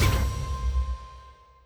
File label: symbol hit scatter